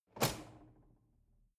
drawer cutlery close draw short soft

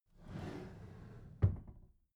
packed drawer smooth clatter close soft halfway

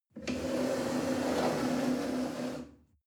chair wood slide on floor soft

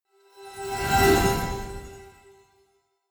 sweep

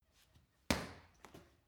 punching bag kick soft

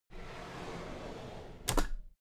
drawer cabinet equipment open soft

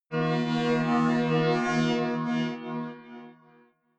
sci-fi galaxy rising ring

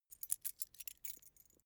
key ring small pick one

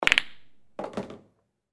billiard ball shot

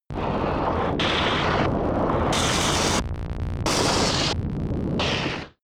scatter vent buzz

